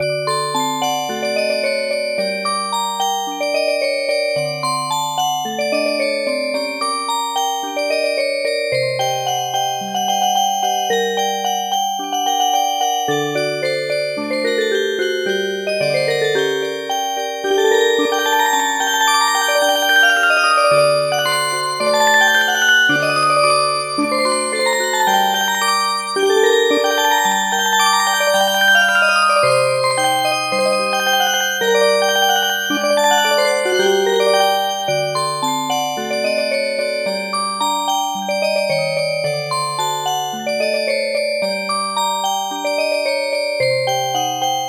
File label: Music Box